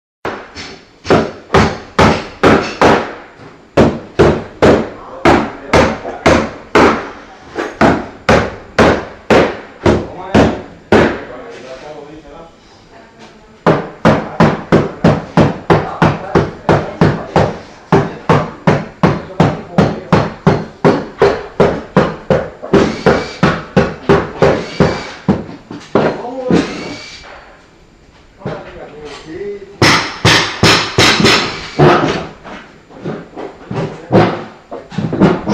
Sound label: Background Noise Construction Noise